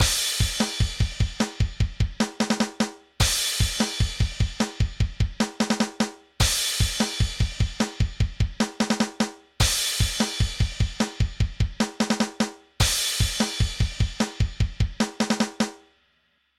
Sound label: Pause Music